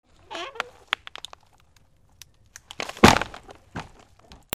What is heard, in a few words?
FALLING